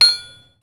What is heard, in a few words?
Hammering metal; INDUSTRIAL